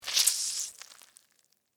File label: Squish Orange, Texture, Squish, Orange, Fruit; Squishes; Objects; BlastWave